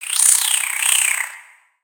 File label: Spider Idle Look; Lego; creatures mechanical